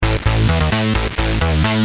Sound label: Bass, Industrial